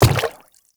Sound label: Quadruped Medium Walk Water, Footsteps; Warframe; Infested